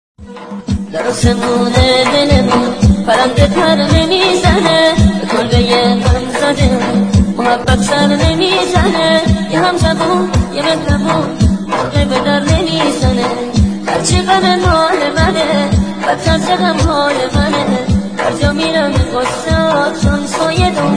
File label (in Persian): Sedaye Bache